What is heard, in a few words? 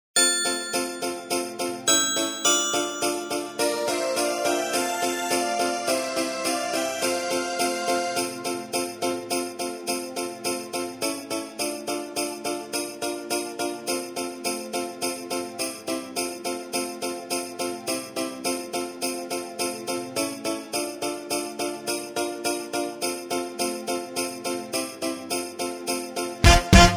sax solo